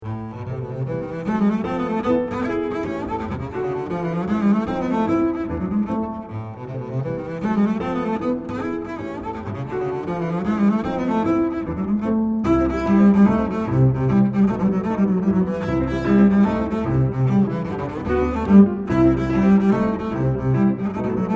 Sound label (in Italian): tarantella, midi